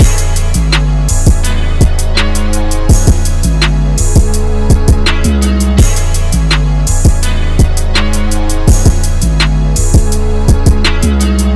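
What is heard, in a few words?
harmonic rap trap C sharp minor; instrumentals; strings; forever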